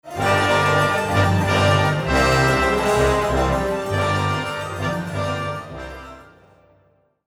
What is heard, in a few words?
Play Stinger